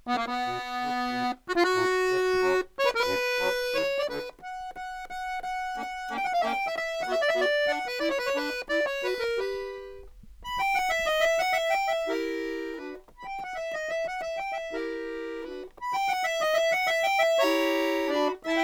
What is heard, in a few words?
bayan